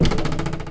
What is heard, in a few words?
bamboo dug; everness